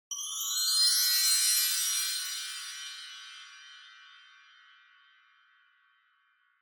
Wind Chimes Reverb Up Wide

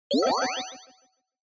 Single Accent Dry

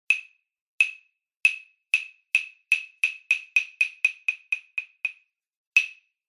Hyoshigi

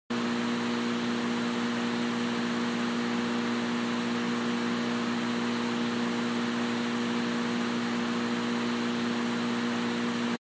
TV Signal Noise, Close

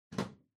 Power Strip Far Floor Gentle Plug In

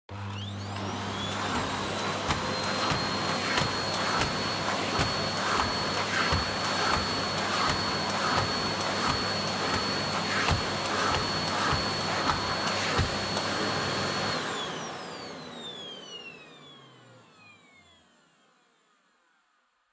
Vacuum Cleaner Vacuum Floor Soft High; 掃除機; バキューム 床 ソフト 高

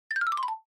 Xylophone Down Short

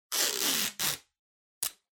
Sellotape Pull Rip

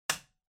Light Switch-Box-Rocker (Dry-Hard)

